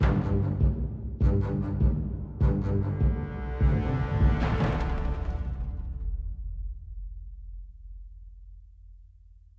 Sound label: Action Fight Or Flight 140bpm Intro; project zomboid